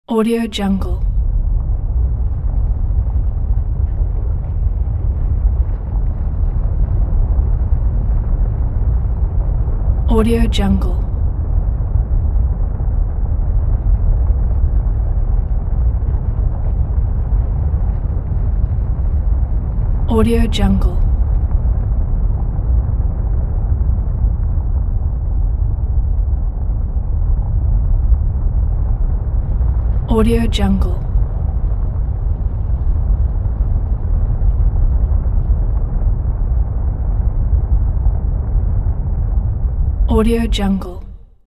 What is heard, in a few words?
earthquake heavy rumble; sound ideas